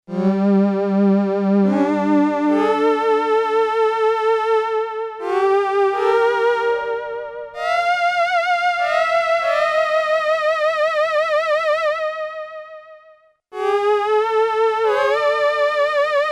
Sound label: VOICE, Korg DW-8000; Factory Patches